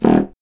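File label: wind contrabassoon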